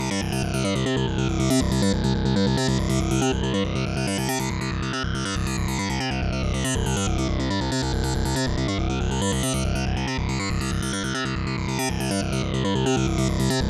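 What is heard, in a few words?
140 bpm C synth, Trance Synth Loops; Trance Explosion Vol1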